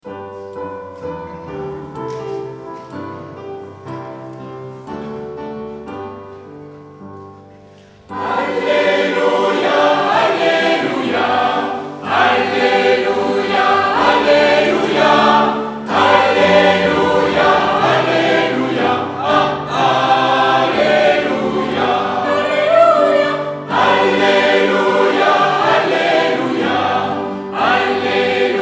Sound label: Schola Alleluia (lingala); Alleluia Africain